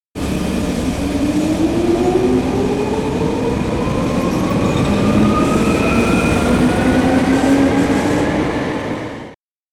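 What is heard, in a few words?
train station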